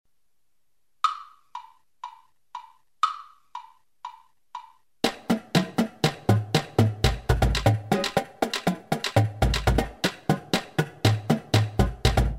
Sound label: click